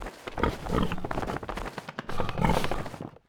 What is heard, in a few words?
shake body; Rust